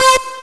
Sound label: heavy; Descent Shareware